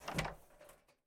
Electro Open; Game rip; MiSide

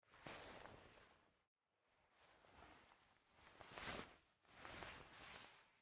Player Stay After Hit; MiSide

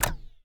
Switch Click; Game rip; MiSide

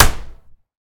Maneken Kick; Game rip; MiSide

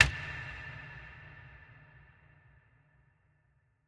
Key Unlock; Game rip; MiSide

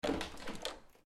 Door Handle; MiSide

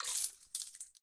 Buy; MiSide

